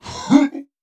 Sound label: voice monster